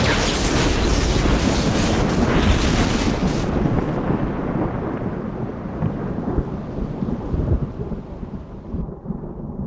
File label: thunder zap